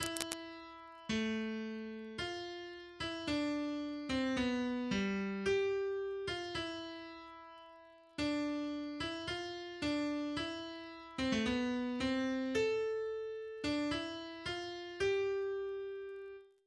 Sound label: sad violin